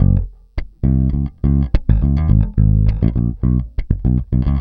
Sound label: Pop, Bass